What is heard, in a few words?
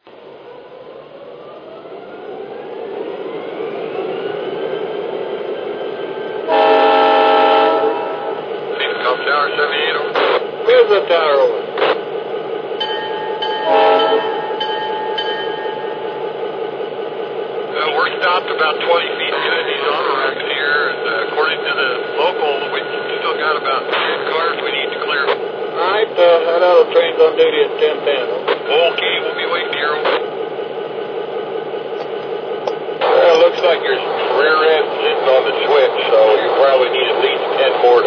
gp35, trains